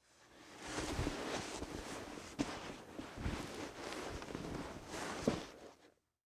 Cloth Blanket Adjust; household

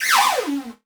Multimedia Internet CD-Rom Flash Whoosh

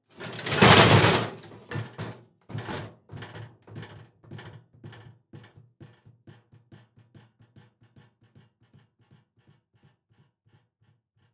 Impact Metal Hit Cement Long Rocking Object; Impacts

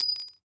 Weapon Pin From Grenade Tossed On Cement Floor; AdobeCC

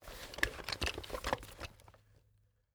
Industry Belt Tool Belt Moving With Tool

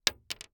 Weapon Bullet Shell 9mm Drop On Solid Wood; AdobeCC

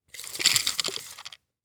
Industry Tape Measure Pull Out

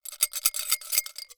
Foley Glass Rattle Broken Glass In A Glass

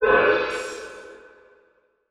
Multimedia Internet CD-Rom Flash Element

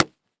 Horror Impact Body Hit With Aluminum Baseball Bat

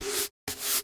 Household Broom Sweeping Cement Floor

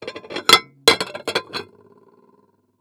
Dishes Stack Ceramic; household

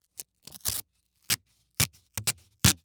Industry Tape Pull Off Roll

